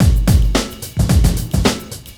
Beat 110bpm; musicradar

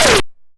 Snare, YM, CHIPSHOP HITS DRUMS; ChipShop Deluxe

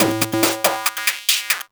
140 Synth A, 140 BPM; loops; CHIPSHOP DELUXE

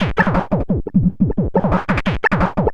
PO Drums, 175 BPM; ChipShop; CHIPSHOP DELUXE; CHIPSHOP LOOPS

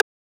Rimshot, techno drum

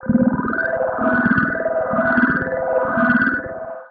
Car Sick, techno drum fx; Single Hits

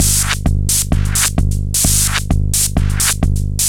Beat (130BPM), techno; techno drum fx

